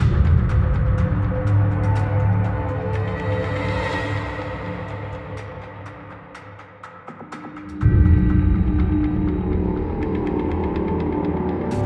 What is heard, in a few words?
bgmusic exploration; Iron Marines